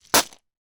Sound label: game letter tiles in cloth pouch throw down on board; scrabble